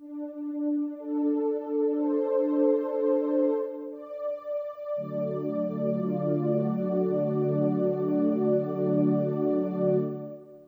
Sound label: jingle; Ospiro